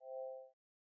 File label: success chime